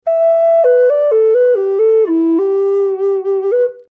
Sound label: e minor concert, flutes; hi vol